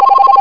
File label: tweedle